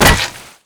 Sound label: sticky bomb plant; Call of Duty Modern Warfare